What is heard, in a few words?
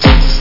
deep drum; house-sample; instruments